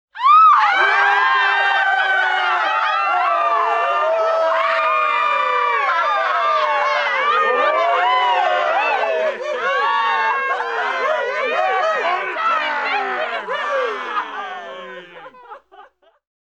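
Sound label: medium crowd shouts screams; Asian Show Sound Effects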